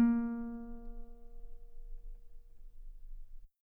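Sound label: guitar A sharp 3 very long piano normal; bananenkeyboard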